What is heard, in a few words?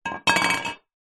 household cup metal camping knock over wood counter; zapsplat